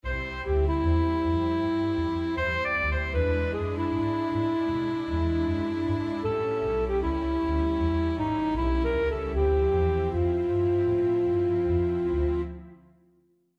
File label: Walking Bass